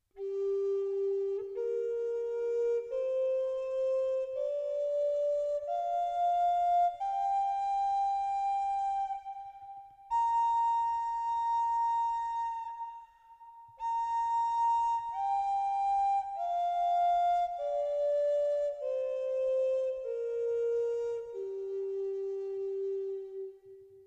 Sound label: doremi, indian flute